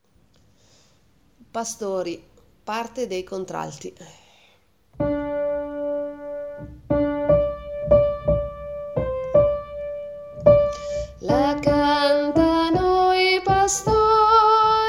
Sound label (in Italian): contralti